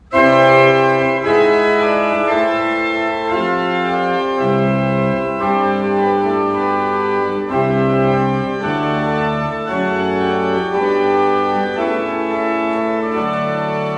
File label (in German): Plenum, Orgel; Dettenschwang; Renovierung